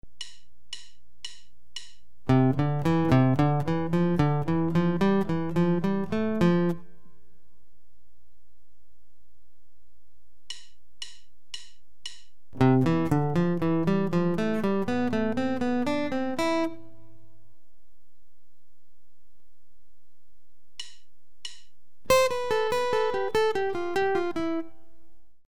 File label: Permutation exercises, guitar